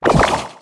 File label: butchered step